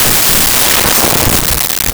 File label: Small Caliber Hand Gun